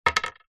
ice cube drop wood; food drink

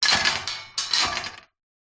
industrial gate metal locked chain push try open

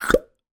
cartoon squelch pop; zapsplat

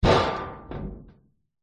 impacts metal panel large hit loose rattle; zapsplat

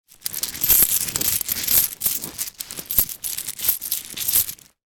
cloth money pouch with coins in movement

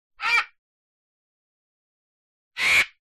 Bird Vulture Two Very; audio hero

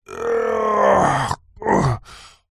human male vocalisation pain growling weak ahhh; zapsplat

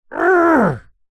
human male grumpy angry frustrated growl; zapsplat

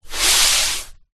industrial paint brush long single stroke; zapsplat

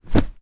foley towel drop on pile of laundry

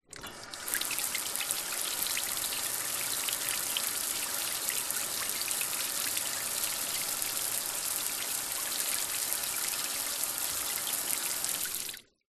household shower outside on off

